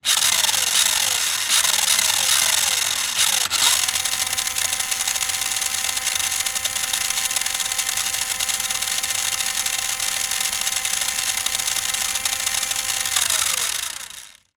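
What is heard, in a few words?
toy remote control car motor drive wheels spin air upside down; zapsplat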